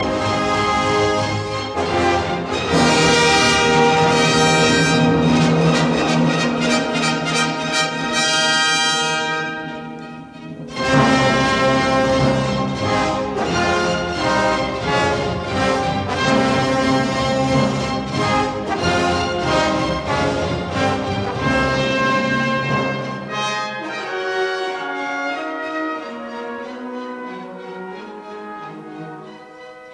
Symphony in C minor, Finale Feierlich nicht schnell; Symphony No 8